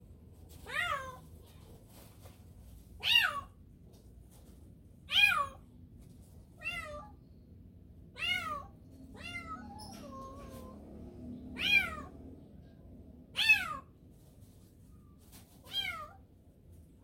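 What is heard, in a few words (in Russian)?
котенок зовет маму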